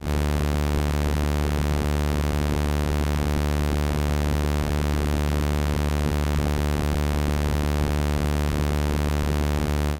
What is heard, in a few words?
steelstring guitar